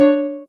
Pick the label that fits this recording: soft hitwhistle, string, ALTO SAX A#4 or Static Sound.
soft hitwhistle